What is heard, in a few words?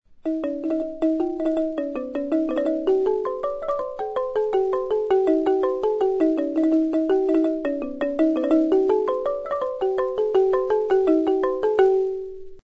roneat; cambodia